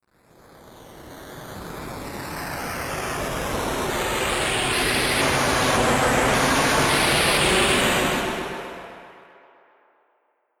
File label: wind wave